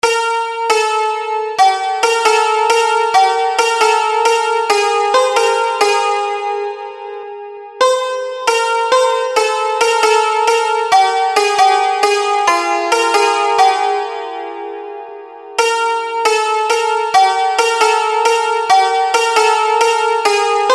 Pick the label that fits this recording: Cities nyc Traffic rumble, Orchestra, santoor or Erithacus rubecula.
santoor